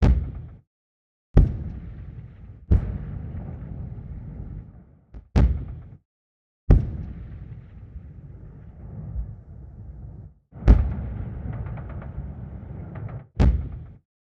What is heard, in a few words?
explosions rhythmic; World of Tanks Blitz; hangar loginscreen